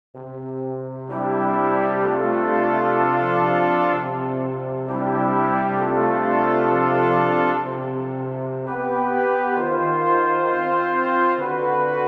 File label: C orchestral